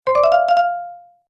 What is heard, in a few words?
Happy Game Bonus; Video Game Sound Effects